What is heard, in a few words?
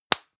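lipstick open hard, Bathroom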